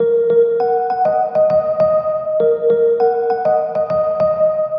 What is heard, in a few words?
100 Ebm Dark Keys Synth